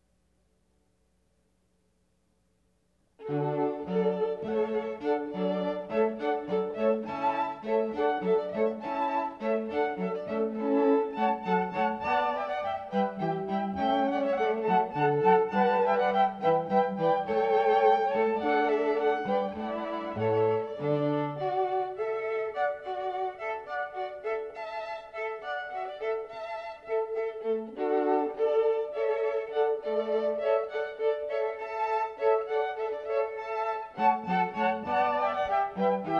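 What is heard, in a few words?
string quartet; Hornpipe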